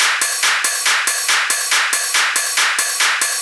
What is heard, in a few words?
Loops 140 BPM Hihat Percussion Loops; Vengeance - Essential Clubsounds Vol.4